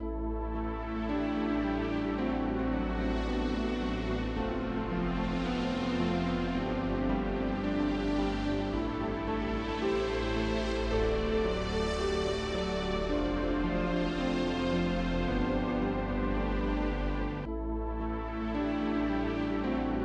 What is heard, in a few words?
Stingers success Loop; Teenage Mutant Ninja Turtles: Splintered Fate